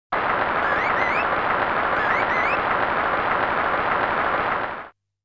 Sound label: Applause and Whistle; string quartet